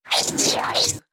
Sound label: Scifi Production Element - Communication Burst